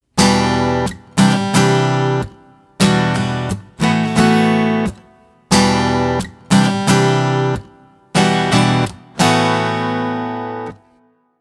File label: Open chords example, acoustic chords; 30 acoustic chords